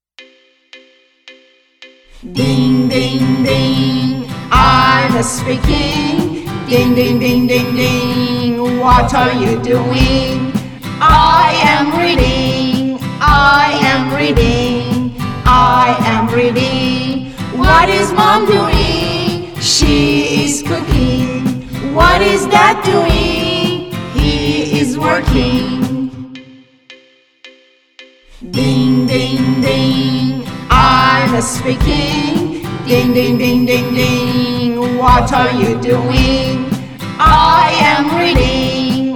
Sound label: ding ding Stereo